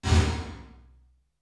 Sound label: REAL ROOM